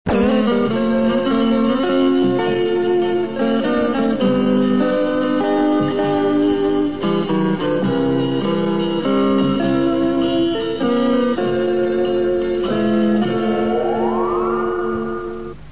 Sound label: Cocoshaker